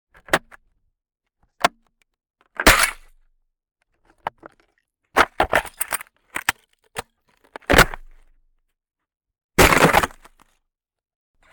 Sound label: Breaking Large Ice; Cgeffex